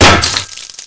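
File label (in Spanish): golpe armadura; severance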